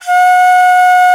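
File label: soprano bansuri, World Winds; E-MU Producer Series Vol. 7 – Old World Instruments; World Combos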